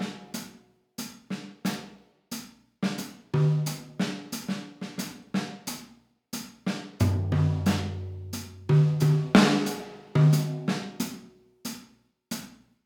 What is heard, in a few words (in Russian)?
drums, электро-джаз; ударные и барабаны; ECM